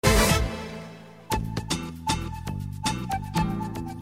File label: Message Signal